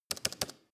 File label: keyboard hits backspace; kommunikation; computer